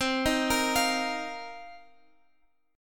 C Minor 11th C Guitar Open F; Cm11 arpeggiated; x,x,12,10,13,12

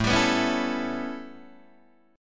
A 9th A, Guitar Open D

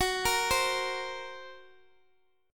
F sharp Major Flat 5th F sharp, Guitar DADGAD; chordshape; down

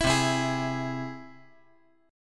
C Augmented down

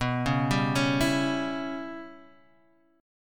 Db Diminished B, chord; arpeggiated; Dbdim/B

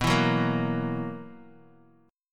B Major 7th Suspended 4th, chord

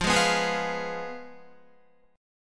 F Diminished Guitar; Fdim strummed; JGuitar

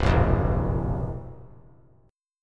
C 7th Flat 5th C down, chord; C7b5 strummed; JGuitar

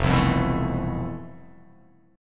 F Major Sharp 11th; strummed; JGuitar

